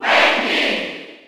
Cheer German; Wendy; SSB4

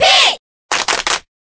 Cheer Korean; Pit; SSBB

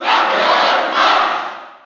Cheer; Captain Falcon; SSB4

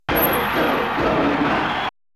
Chanting; Goemon

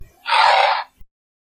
Wiimote Sound; Donkey Kong

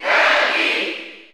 Cheer German; Kirby; SSBU

